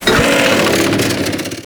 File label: Thumper Pain Groan; Grineer Thumper Bot; Warframe